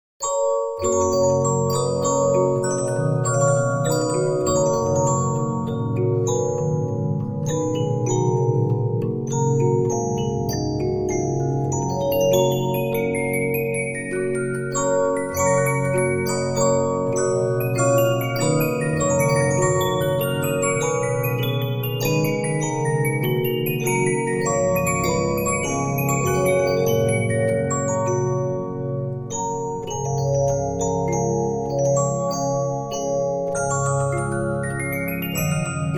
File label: Regina, 15inch disc musicbox